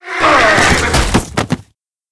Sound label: Mob die; Monster; 혈적광풍대; 혈적돌격대